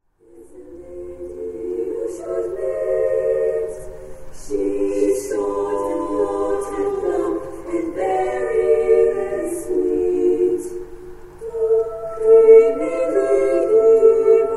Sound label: SSAA